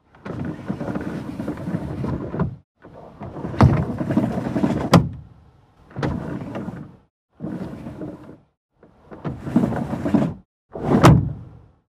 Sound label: Car glass lowered, raised manually